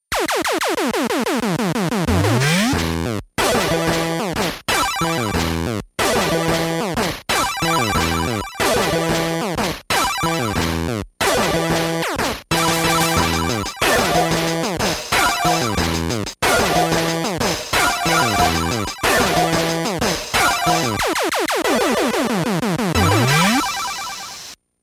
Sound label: Instrumental; Steven Universe